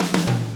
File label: PULL, Backbeat, PULL UP; Spectrasonics